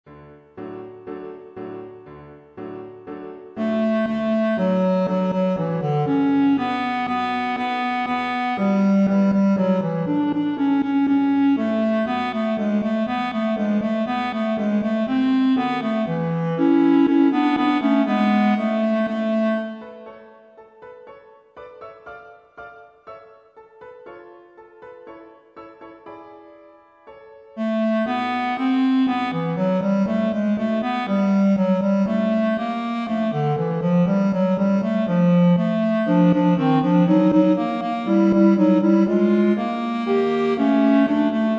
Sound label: Vocal Entrance Tutti; The Good Grand Duke